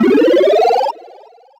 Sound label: Note Block Super Jump High; SM3DL